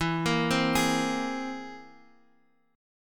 C Augmented 7th E

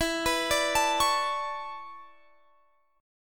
E 13th Suspended 4th

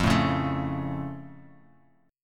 F Augmented F; strummed

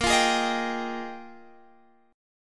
Bb 13th Suspended 4th; Bb13sus; strummed

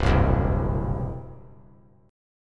C-Major Flat 5th; strummed

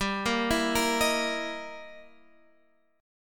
G Minor 6th Flat 13th G

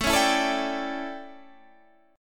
A 11th, guitar chordshape

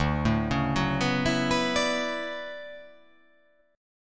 D Major 13th D down

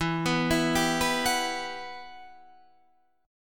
E Minor Add 9th; madd9:1,b3,(5),9

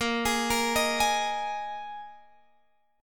Bb 7th Suspended 4th Bb Guitar Open G

